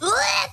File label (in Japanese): オ゛エ゛